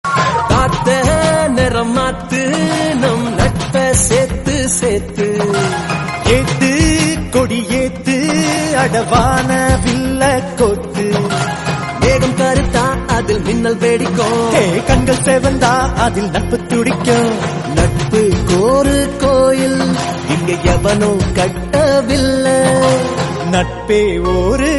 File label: Tamil Ringtone; Arrambam